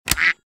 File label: target pop back up quack; Fluffy Arcade: Doubleup Ducks LuckyTap; Eyecon